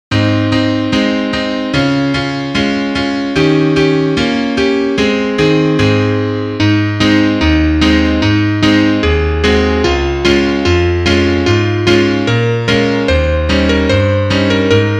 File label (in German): Klavier; schwaebsche eisenbahne